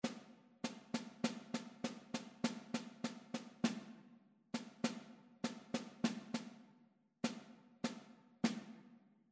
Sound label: rhythmic error detection; ear training